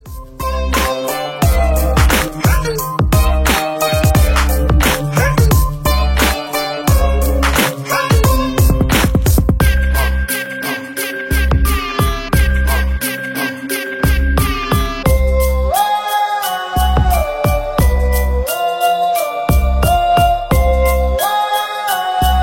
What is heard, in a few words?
BGM Ringtone